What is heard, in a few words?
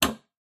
hammer hit